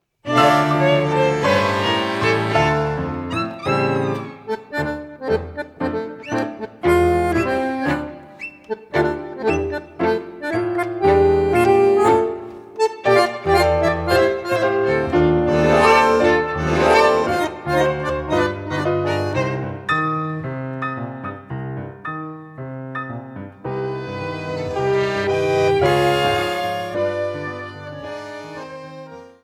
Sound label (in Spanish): Tango progresivo, Milonga candombe